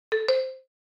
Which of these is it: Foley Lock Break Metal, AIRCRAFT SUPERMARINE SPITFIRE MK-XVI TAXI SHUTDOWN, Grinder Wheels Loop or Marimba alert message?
Marimba alert message